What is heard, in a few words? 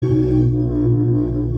Alien amb